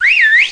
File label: GOOD whistle